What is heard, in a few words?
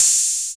HH 808, TR-808; 90 s Sample CDs; Sound & Vision - Gigapack I CD 1 (Roland); TR-808 & 909